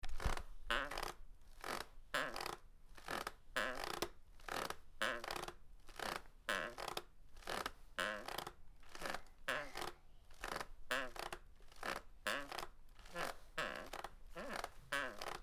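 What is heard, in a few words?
rocking chair creak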